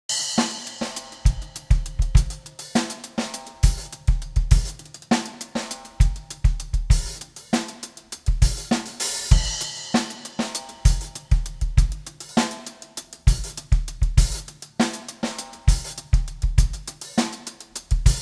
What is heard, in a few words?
Drums